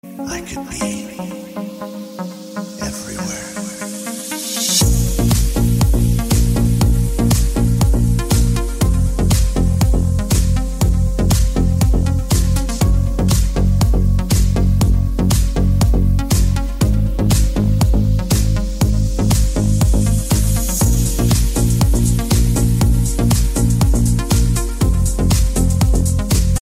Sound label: fury apple ringtone